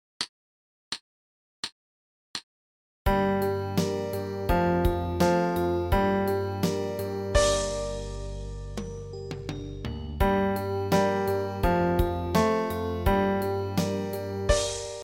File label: clarinet climb